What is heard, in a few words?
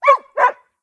Dog; blue archive